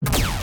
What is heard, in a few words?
Mining Laser Hit